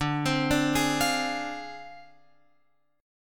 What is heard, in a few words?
D Minor 7th Flat 5th Guitar Open F; Dm7b5; arpeggiated